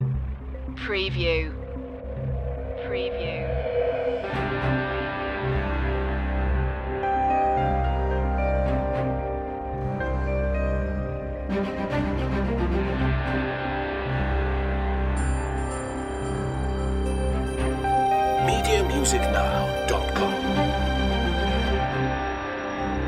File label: suspicious activities no perc bass